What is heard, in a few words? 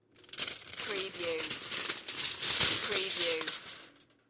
Metal foil, aluminium foil crumble